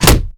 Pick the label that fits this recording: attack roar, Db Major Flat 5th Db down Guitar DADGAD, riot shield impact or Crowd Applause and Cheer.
riot shield impact